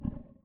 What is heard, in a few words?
growl